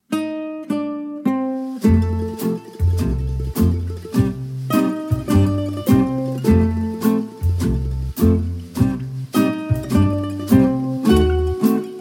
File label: background music